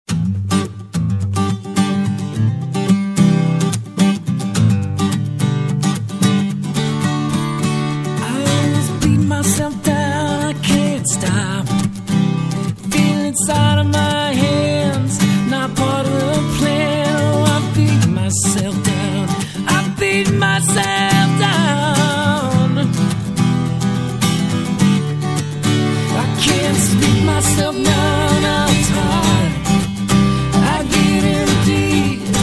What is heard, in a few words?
Simmering Rise